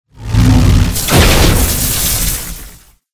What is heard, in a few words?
Power talons